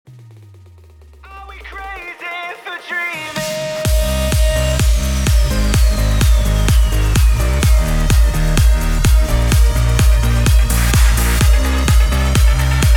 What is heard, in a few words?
PROGRESSIVE CUT 127 BPM